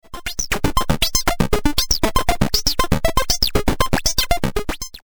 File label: Pll reso fake; experimental